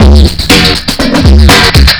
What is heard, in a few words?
bong blast, breaks breakcore